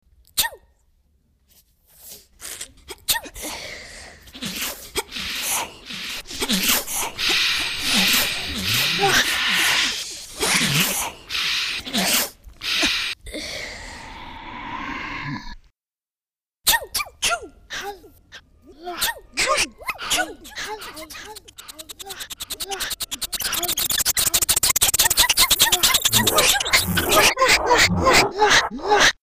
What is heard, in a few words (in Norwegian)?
Hvisk og nys